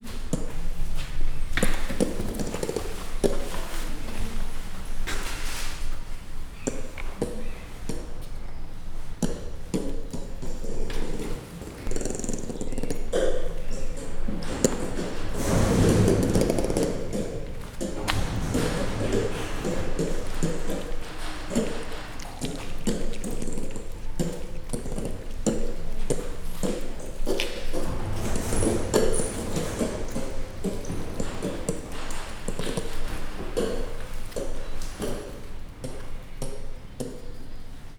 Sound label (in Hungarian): kummognak, egyikük a jobb mikrofonnal eszik, gyűrűsfarkú maki; jaszberenyi zoo 2015